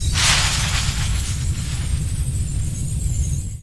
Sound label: In Flight Whip Crack